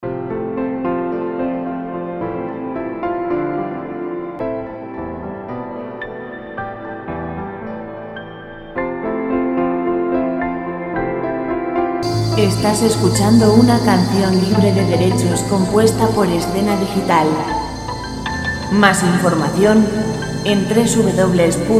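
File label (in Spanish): pianos lejanos, lent musica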